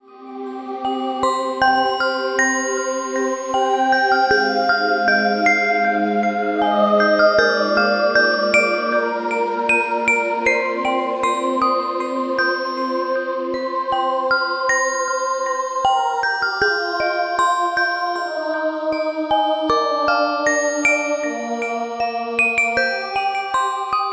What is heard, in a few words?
Warm Bells